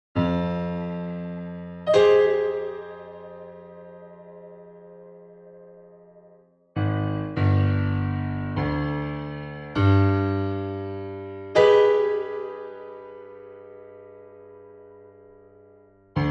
Piano Acoustic Hall Guitar; Tverb

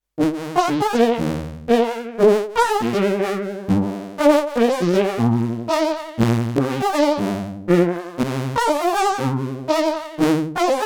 FM Saw Randomizer; Nunomo Qun Mk2

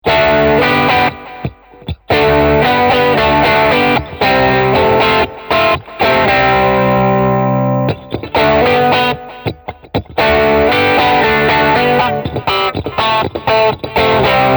Tele Open G

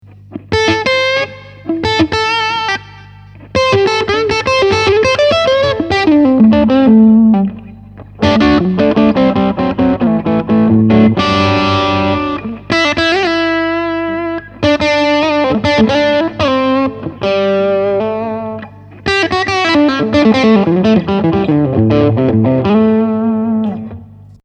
Max. Gain Overdrive off

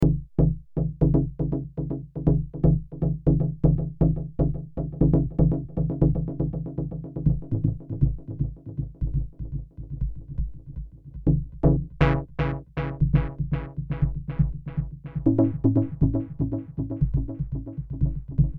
Roland SE-02 3 Osc Detune Dub Delay Stabs

